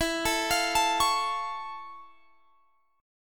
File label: F Augmented Major 7th E